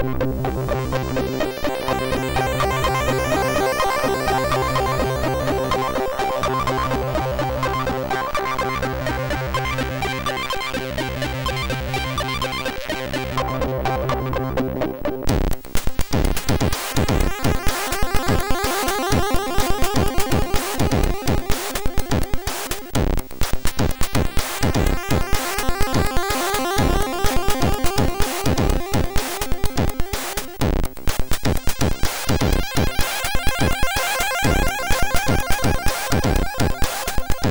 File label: Intro sid MOS6581R3; Spacebar Intro